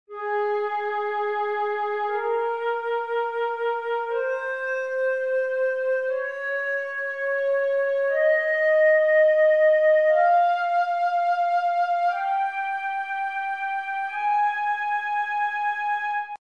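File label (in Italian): la bem maggiore, scala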